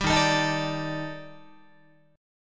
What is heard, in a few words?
D sharp Major 7th Flat 5th, down, Guitar; strummed; JGuitar